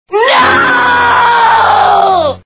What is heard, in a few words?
noooo; Who's Afraid of Virginia Woolf